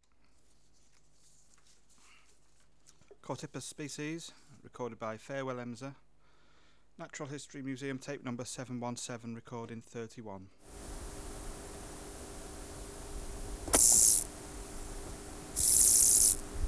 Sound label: Chorthippus